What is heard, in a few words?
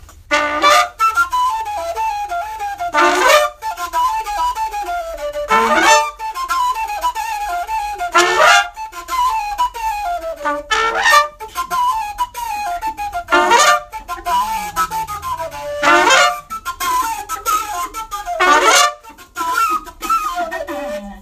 Yevanic trumpet hits on c